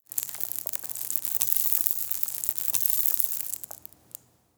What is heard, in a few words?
small debris fall